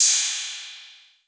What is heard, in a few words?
808, CYMBAL; Wizoo - Platinum 24 Electronic